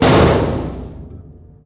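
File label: panels impact large, metal; Portal 2; Source Engine; video games; physics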